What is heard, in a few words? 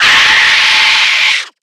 scream